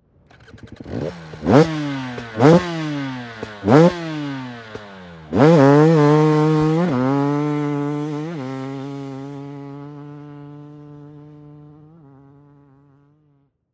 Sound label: KTM EXHAUST 300 FMF